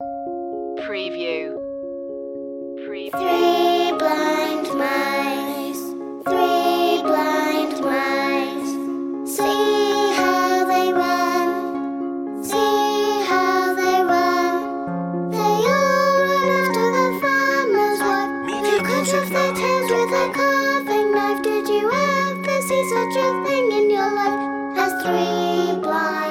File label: children vocals; three blind mice